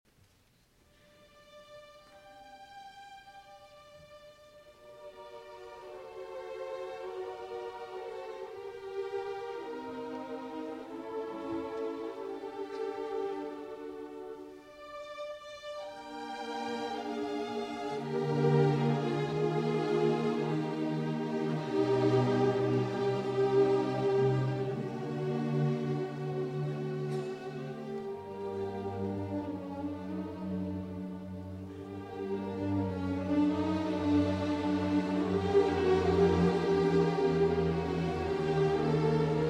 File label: Cello Concerto in D Minor, Intermezzo. Andantino Con Moto (Live); Lalo